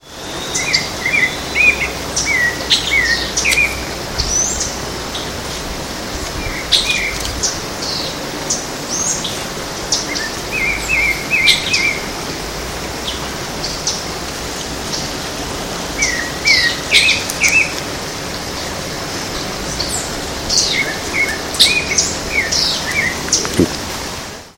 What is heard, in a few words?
Turdus leucomelas, Mongagua SP; Pco das Antas